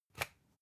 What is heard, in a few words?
usb port metallic out